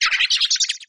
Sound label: Chirpy Squealer